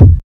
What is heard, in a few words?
Kick